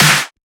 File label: SnareClap; FLPRO